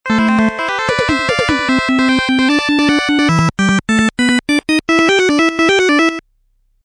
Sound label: start